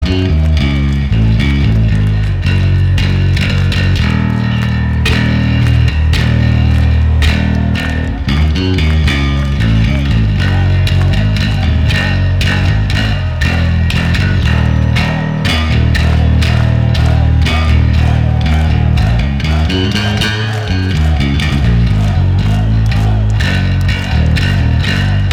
Bass Solo

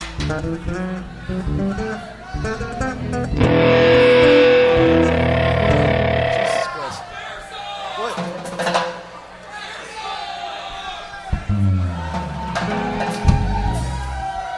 Tuning

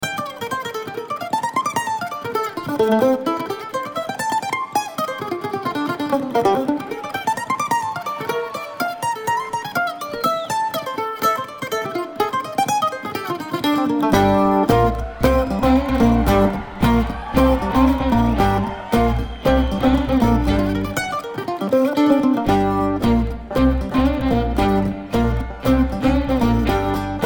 Kentucky Mandolin

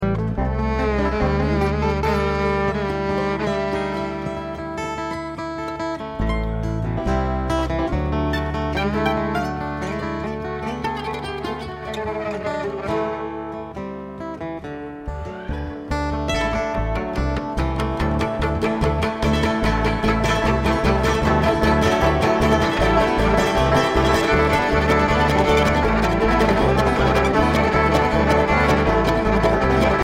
Traffic Jam

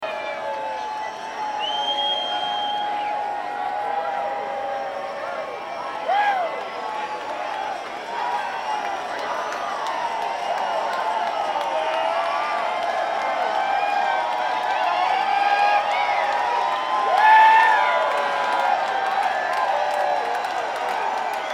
Crowd

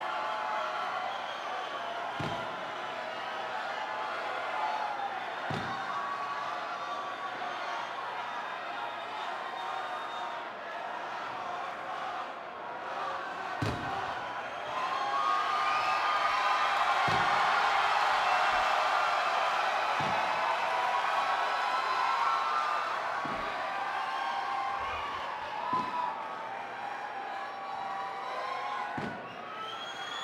Banter Crowd Fireworks

